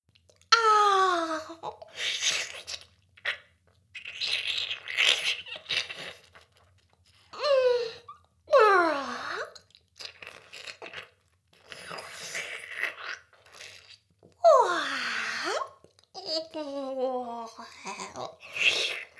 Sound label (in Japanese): フェラ音声; 魔法少女マスカリマッスル